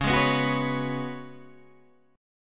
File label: F sharp Suspended 2nd Suspended 4th